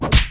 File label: Hero Monk Multihit punch